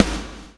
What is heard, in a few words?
BLOW; Spectrasonics - Backbeat